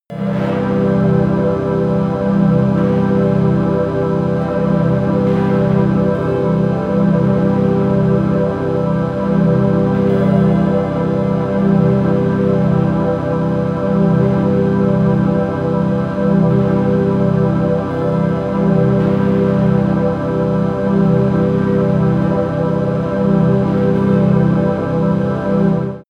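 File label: chrystal air, pads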